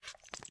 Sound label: dog idle; Apotheorasis